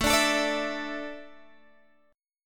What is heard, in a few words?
A Suspended 4th down Guitar Standard; Asus4; strummed; JGuitar